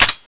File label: claymore activate